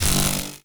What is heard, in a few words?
Beam Hit Notification; Warframe; Vehicles; RailJack Enemy Ship